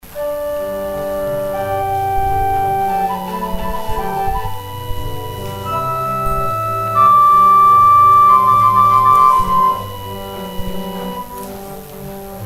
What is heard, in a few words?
organ interlude; interlude during the offering